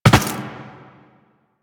Land Glass, Footsteps; Warframe; Tenno

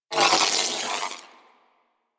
Ball Held Spark; Warframe; Missions; Tenno Ball

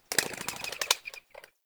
Wetland Forest Bird Scatter; Ambience; Day

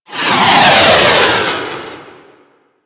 Plunge Ability Angry Proj By; Enemies; Abilities; Warframe; Duviri; Duviri Dax; Dax Knight

